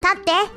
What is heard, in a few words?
jump; Iroha